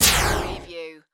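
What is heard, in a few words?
Production Element Hit Whoosh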